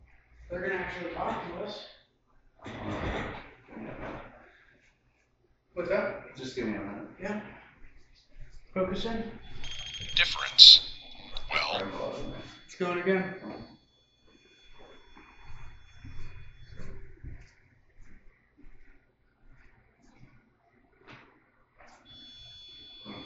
2nd floor open space EVP; caliente pizza draft house crafton investigation

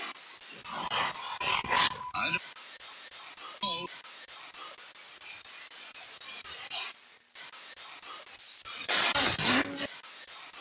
2nd Floor Landing; brookline private residence investigation

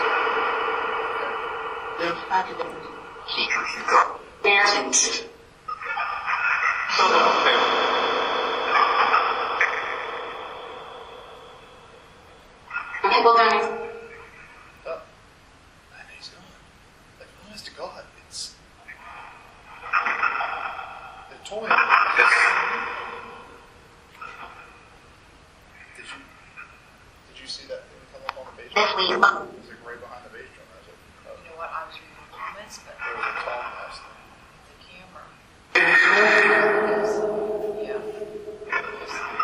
Phasma Box; beaver area high school investigation

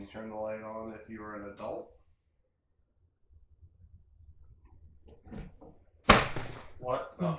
Attic EVP

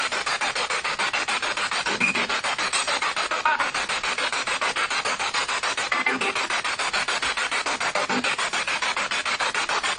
Living Room Spirit Box

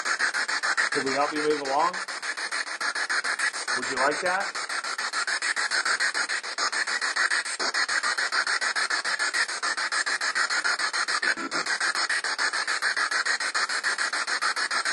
Spirit Box; Basement; wildwood sanitarium investigation

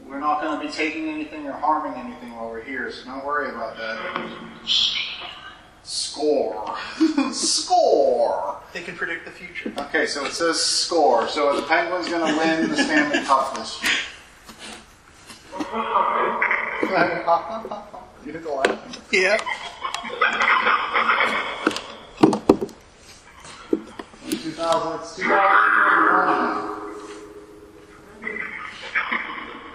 Dungeon Orphanage Phasma; fairfield county infirmary investigation; 4th Fl

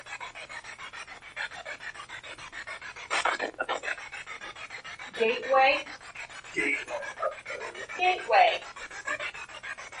Basement Spirit Box; gill house investigation

